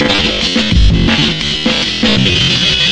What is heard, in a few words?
086 bpm stretcho loop, DRUM LOOPS and BREAKS